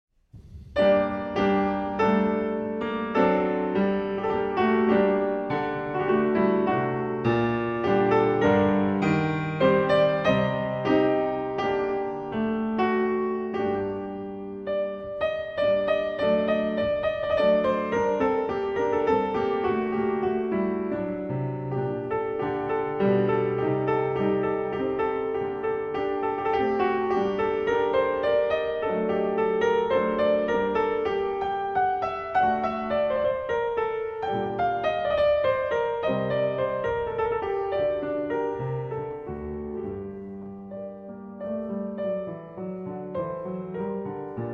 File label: Chaconne in G Minor, For Piano; Bach, JS; BWV 1179; Transcr. Pontier